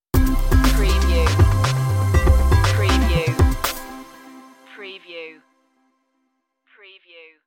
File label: bustle hustle stinger